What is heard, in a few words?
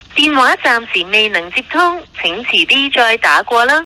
call failure